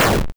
Explosion; Elemental

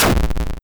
Explosion; Elemental

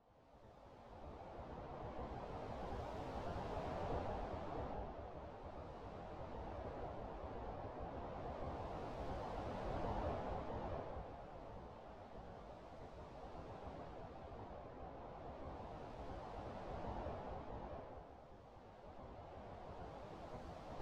Wind; Ambient

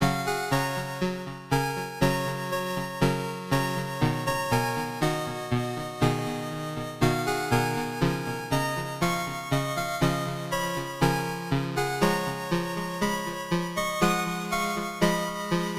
Sad Theme; Musics

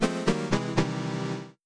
GameOver; Jingles

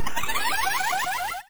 Spirit, Magic & Skill; TowerDefenseGame

